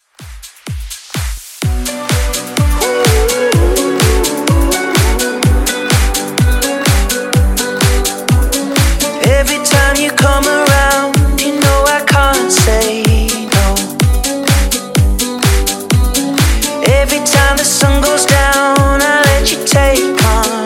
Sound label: Top40 Dance